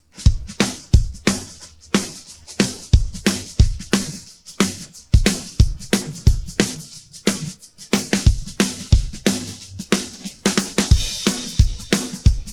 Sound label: pattern, drums